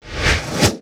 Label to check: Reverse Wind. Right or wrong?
Right